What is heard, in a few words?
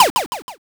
shot